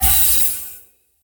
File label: bigwin; DoubleTigersWD; wazdan